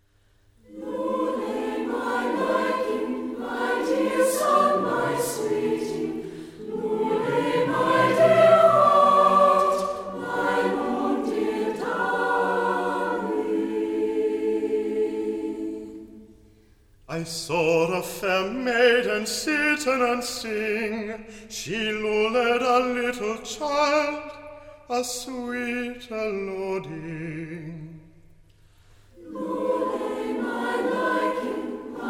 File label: Lullay My Liking, Choir; Brisbane Concert Choir